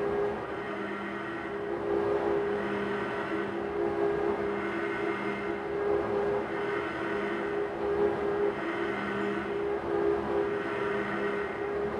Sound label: Hemopump Working Loop; rimworld